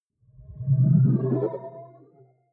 sci-fi display transmission damped

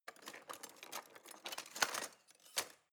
knives wood drawer grab soft

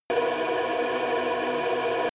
drone spaceship metallic

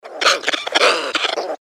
creature

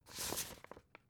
old take

